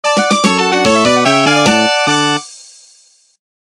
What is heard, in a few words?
Stage Clear